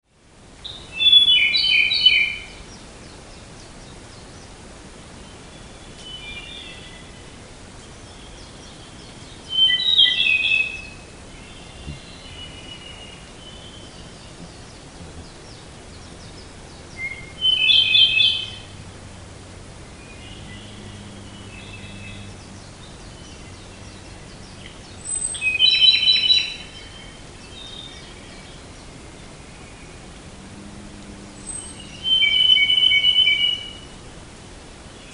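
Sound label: amami akahige, Amami robin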